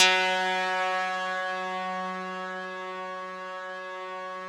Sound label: RESO METAL 2 F#3 L, Yamaha VP-1; Music Store